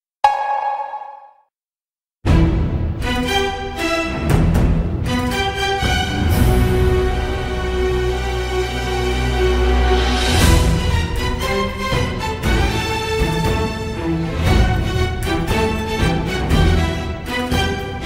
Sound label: floor music; larisa iordache